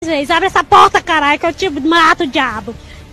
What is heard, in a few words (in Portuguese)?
abre porta